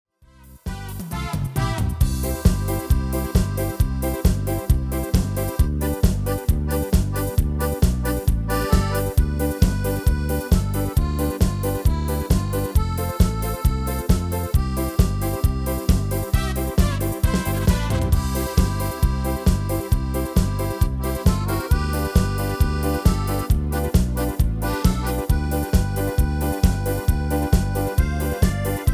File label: MIDI